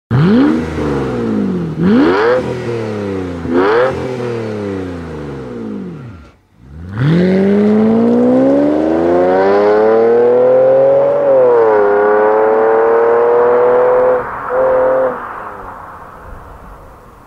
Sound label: BMW 850Ci